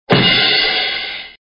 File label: Cymbals, cartoons